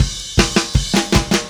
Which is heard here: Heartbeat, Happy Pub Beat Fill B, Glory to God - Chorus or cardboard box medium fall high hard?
Happy Pub Beat Fill B